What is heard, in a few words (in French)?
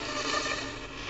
violon